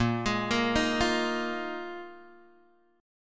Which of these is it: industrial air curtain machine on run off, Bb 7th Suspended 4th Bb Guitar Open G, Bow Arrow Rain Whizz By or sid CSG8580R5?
Bb 7th Suspended 4th Bb Guitar Open G